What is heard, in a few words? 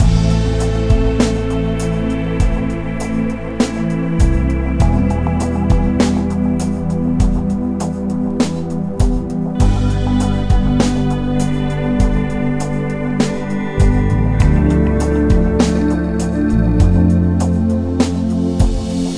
Koloss-Loop; asguaard; rpg maker